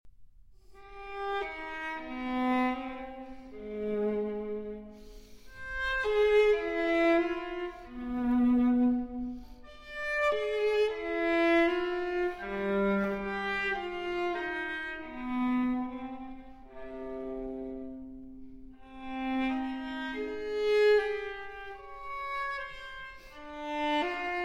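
Cello Suite No. 5 in C Minor Transcription For Viola Sarabande; J.S. Bach; BWV 1011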